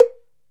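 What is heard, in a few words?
tuned, bala, African; Roland LCDP11 Africa VOL-1; Bala & Xylo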